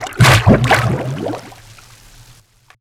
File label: liquids submerged